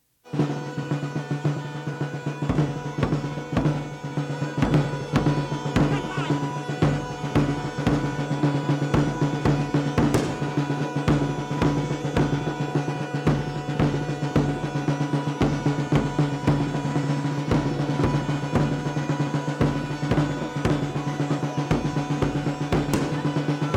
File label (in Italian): altri ritmi dei tamburi; Cardeto; Mammola; Cinquefrondi; Alan Lomax e Diego Carpitella